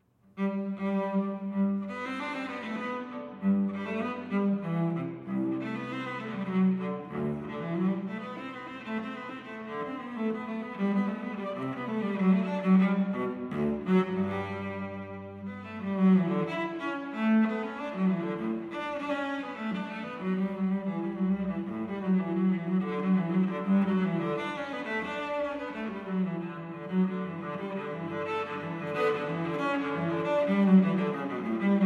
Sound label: Courante from Suite in G Major, cello; J.S. Bach